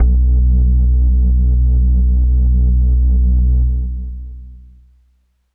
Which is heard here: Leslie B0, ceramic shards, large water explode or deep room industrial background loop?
Leslie B0